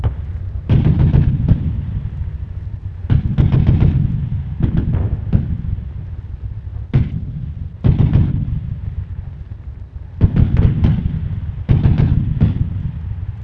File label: Amb Destruction; Red Orchestra 41-45